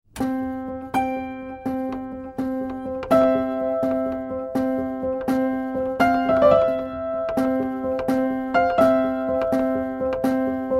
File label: title music